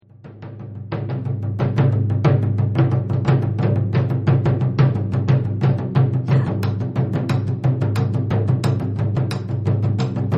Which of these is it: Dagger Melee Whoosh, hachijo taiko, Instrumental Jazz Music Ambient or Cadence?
hachijo taiko